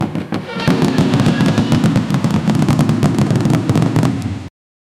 a marching band falling d

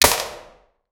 single mp5 9mm gunshot

